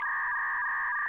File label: dialup err